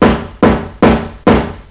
HAMMER

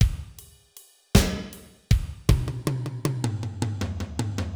Fill, Blues; Pro Tools Blues

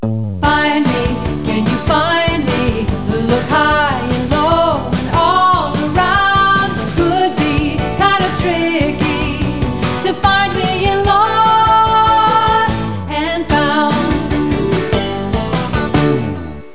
theme; Lost & Found - Volume 1; OmniMedia

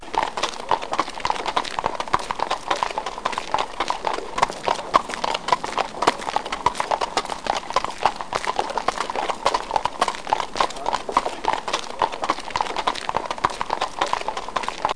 clip clop

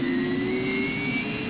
Afterburner; Starbound II

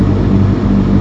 rev mix; Redline

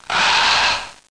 toke out

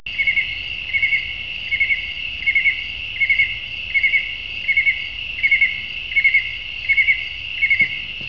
cricket chirp

